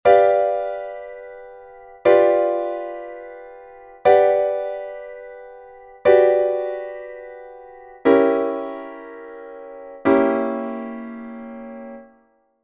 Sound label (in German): Sekundakkord; 3. Umkehrung der Septakkorde; dommer 1865; Septakkorde (7) und Sekundakkorde (2) als 3. Umkehrung der Septakkorde